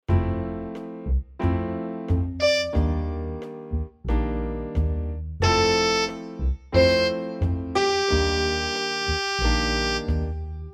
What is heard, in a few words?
Rhythms